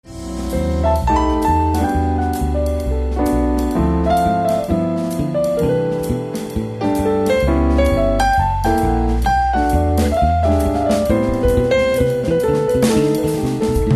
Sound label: Jazz Atmosphere